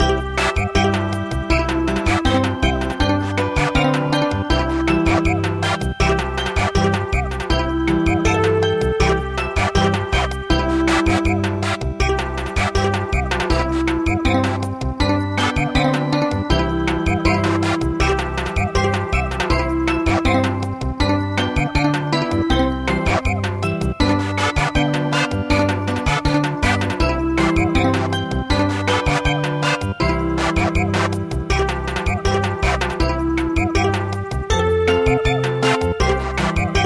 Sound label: Samba; Chick